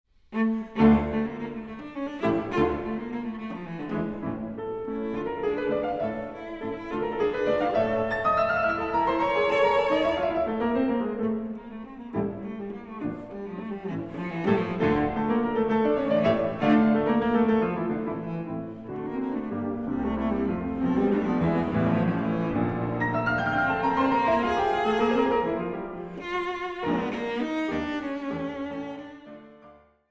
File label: Duo Arp